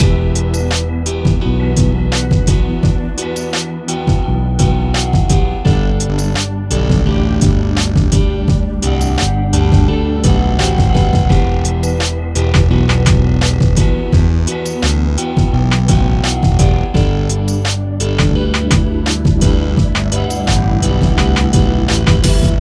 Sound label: 85 booombeat